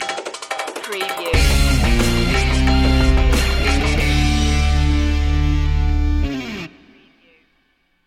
the magic sitar stinger

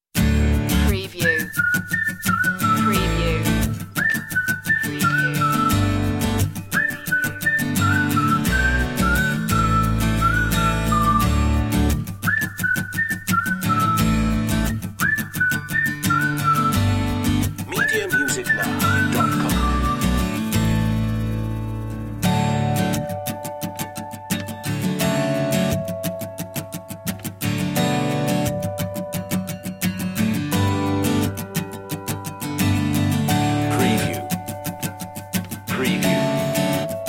whistle acoustic

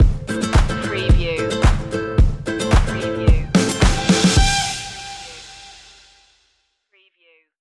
latin stomp stinger

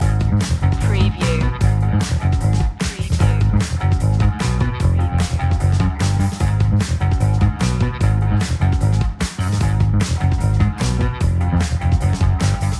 crazy ride loop

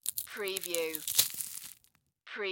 Cracker Crumble Single

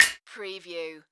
Multimedia Percussion

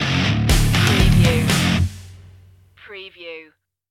pump action stinger

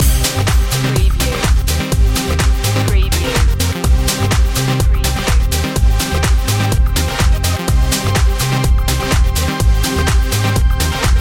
pulse motion loop

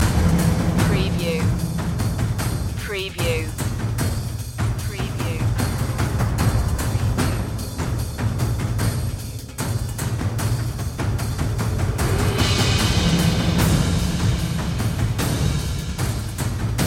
the dark hero percussion loop

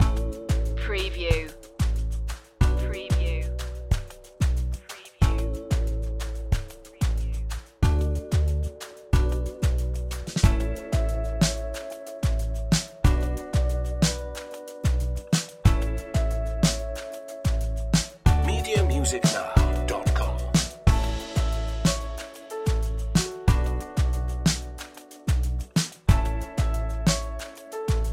calm water medium

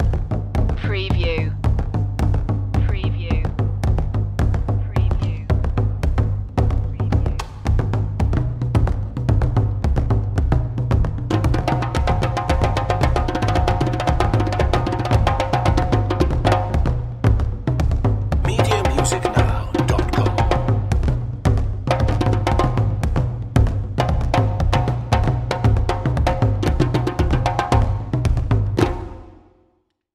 african drums bwea

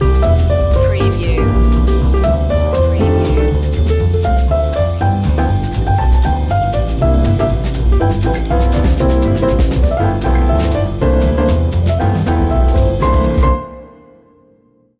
jazz; jingle bells

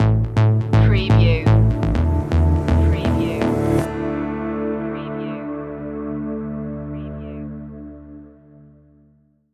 loves trance stinger

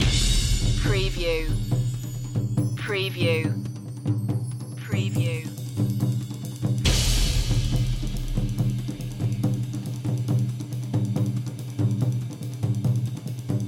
tribal march percussion loop

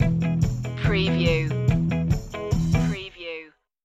vintage upbeat stinger

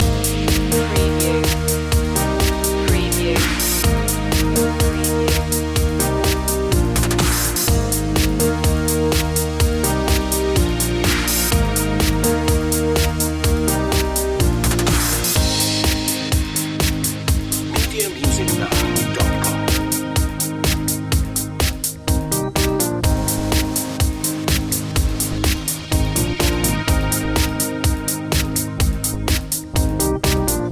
dance train loop

